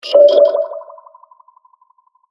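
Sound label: Science Fiction Communication Device Off; Sf Comm Device - Off; Sci Fi Sound Effects; Andrew Fleming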